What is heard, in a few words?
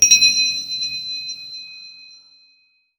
bright crystalline, crystal; bright crystalline collec